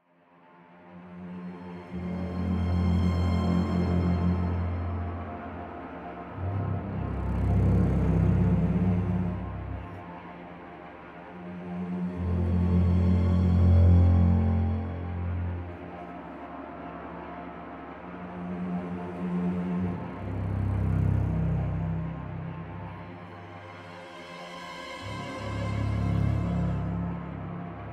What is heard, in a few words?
Caution (Alert Field Boss); Elden Ring; PS4; Xbox Series X/S